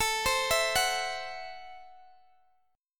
A Minor 6th A, Guitar, Open D

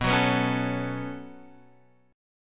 B 7th Suspended 2nd

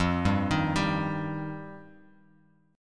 F 7th Suspended 2nd; F7sus2; arpeggiated; JGuitar

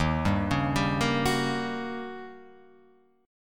Eb 7th Sharp 9th; arpeggiated

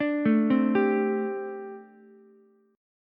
G Suspended 2nd Suspended 4th, Ukulele; Gsus2sus4 arpeggiated; JGuitar

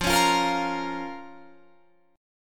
F Suspended 4th Sharp 5th F, Guitar Drop D

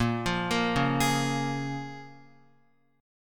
Bb Minor 7th, Guitar, Bbm7 chord; Bbm7 arpeggiated

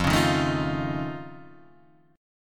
F Augmented 7th F Guitar Half Step Down, chord

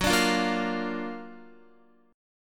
Gb Augmented Major 7th, Guitar; strummed; 4E,4A,5D,5G,5C,6F

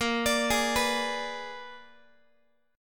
A sharp 7th Flat 9th A sharp down Guitar; A#7b9 arpeggiated; JGuitar

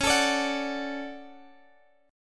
D-Major, C sharp

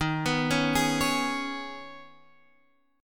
Eb 7th Suspended 4th Sharp 5th Eb, Guitar Drop D; down

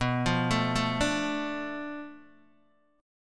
B Minor 7th Flat 5th B Guitar DADGAD

